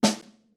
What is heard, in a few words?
snare ff